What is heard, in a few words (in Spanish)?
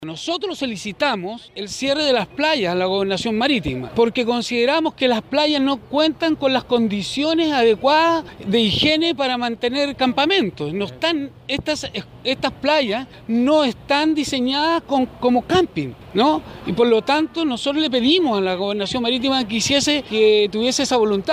tambores